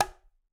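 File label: Bongo High Slap; Latin Percussion; Drums; Drum Hits; Ableton; Factory Packs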